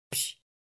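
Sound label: hitstun small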